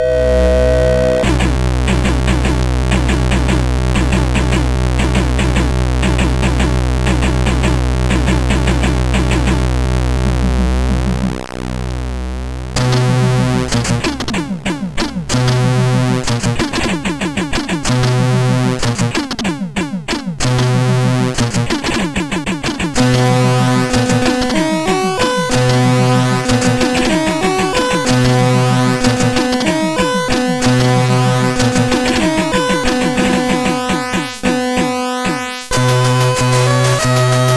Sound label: Train, sid CSG8580R5; Non JCH Newplayer; hvsc